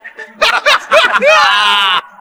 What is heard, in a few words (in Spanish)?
risita